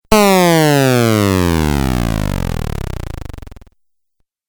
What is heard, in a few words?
Falling Video Game